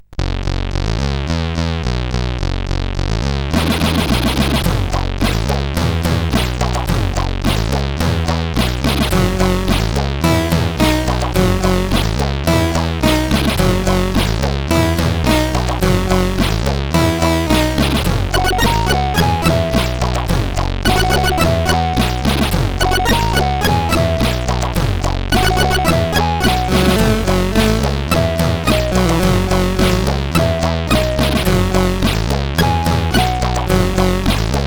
Tune, CSG8580R5; SoedeSoft

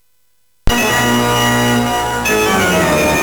Dark Castle, CSG8580R5

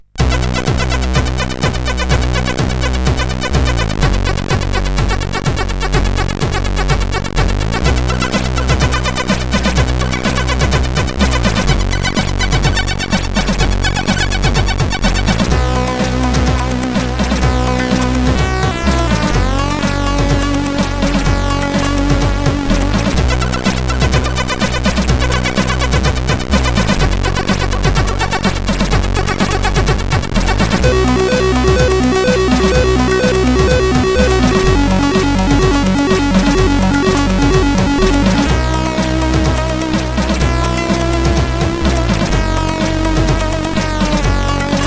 tune, 8580; Siebold Markus

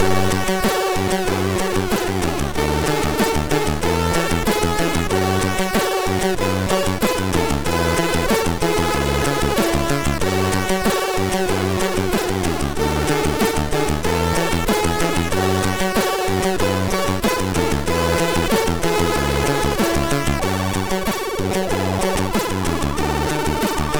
sid MOS6581R2

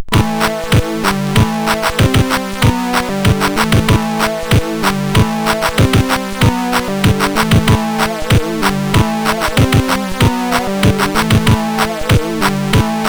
Digi, MOS6581R2; New Digi 3

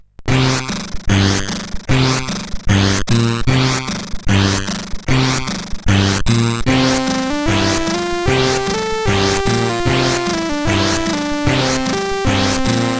Bad music, CSG8580R5

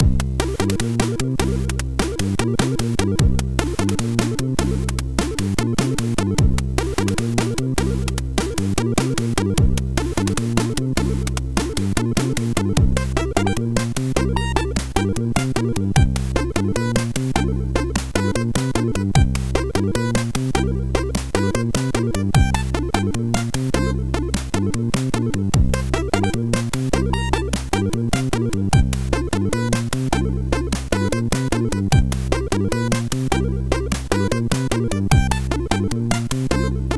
Jazz, sid MOS6581R4

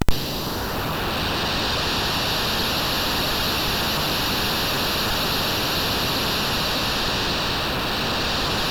FX, MOS6581R3; HVSC; Software House

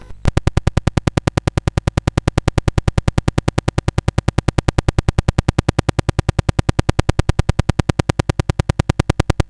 Chopper, CSG8580R5

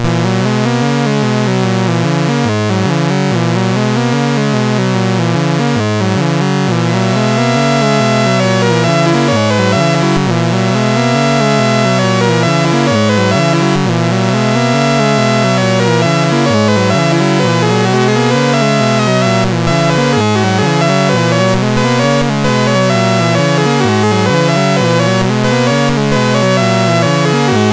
Tybbernyde BASIC, MOS6581R2

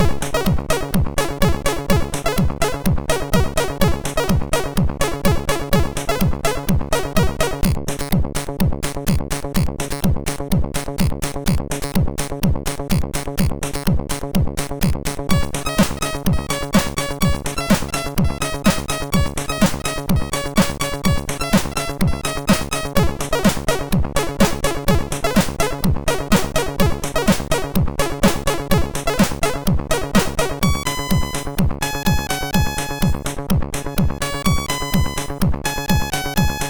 Koto Theme, MOS6581R2; Signor

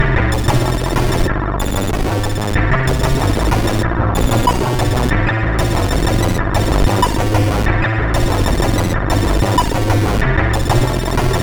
Space Aliens Spaceships, CSG8580R5; hvsc

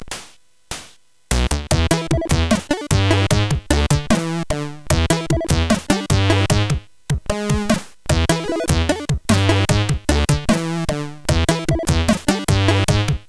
Short Jazz, CSG8580R5

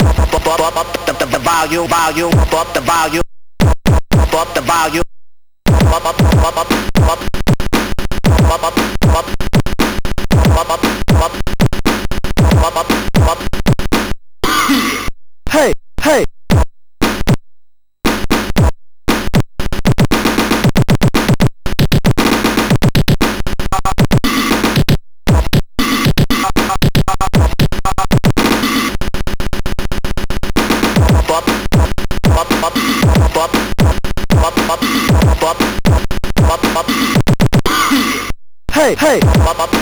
Techno-Mix, MOS6581; MOS6581R3

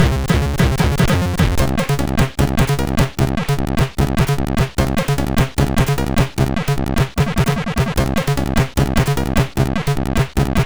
Loading 8 sid MOS6581; hvsc

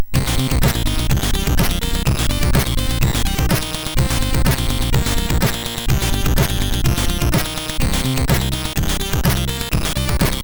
sid MOS6581; Danglefisch

